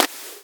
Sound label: Clap; Vengeance Samples